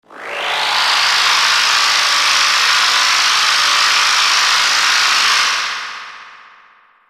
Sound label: beam